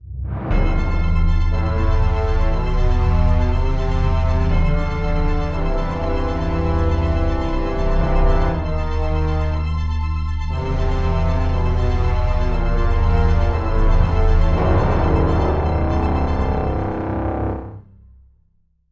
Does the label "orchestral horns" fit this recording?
Yes